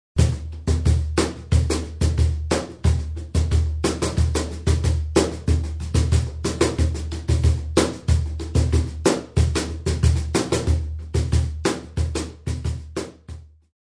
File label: cajon